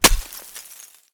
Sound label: ice break